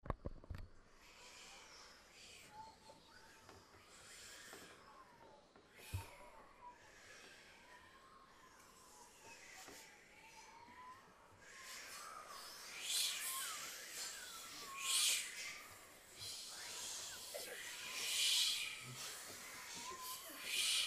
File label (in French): vent bouche